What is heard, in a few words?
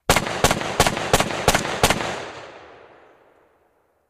357 Magnum Hand Gun